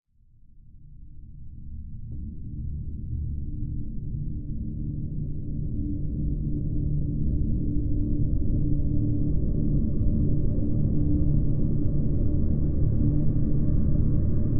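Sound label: drone D; Drn Delusion D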